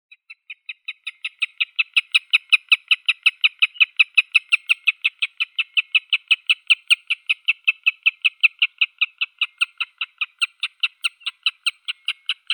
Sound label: shegol tringa erythropus; zvuki prirodi